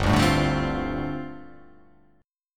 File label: G 9th Suspended 4th